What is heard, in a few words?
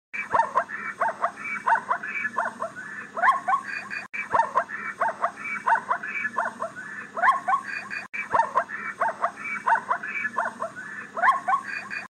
Okapi